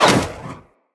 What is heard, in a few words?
Fire Arrow Impact